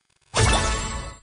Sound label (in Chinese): 开宝箱